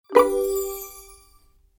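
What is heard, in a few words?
Info Button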